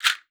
RASSEL; Xsample VOL-9

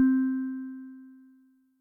Glocken L, Yamaha DTXpress misc voices

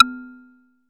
XYLOFON; Music Store Sound Service; Einstein

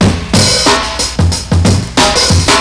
SCHMUTZIG; Soundengine - CSR-000 The Art of Transwaves; PERC WAVES

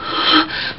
stoehn; Amiga Soundtracker Sample Packs

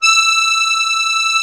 MUSETTE E3, akkordeon; Best Service; Alpine Volksmusik

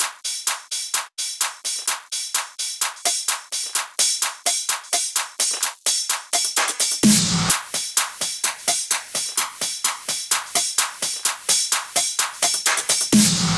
Drum Loop 128BPM FULL ohne BD

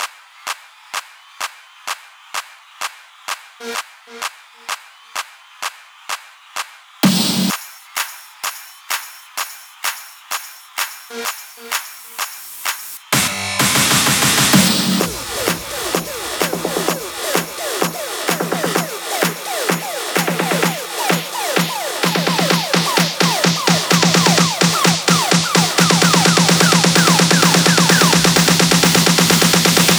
Drum Loop 128BPM FULL ohne BD

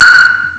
Geschirr; ST-XX Amiga Soundtracker Sample Packs

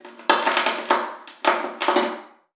KNUSPER, VIOLA FINGER; Xsample VOL-5

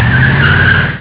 car quitsch; Amiga Soundtracker sample

